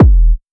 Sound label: Kick Saturated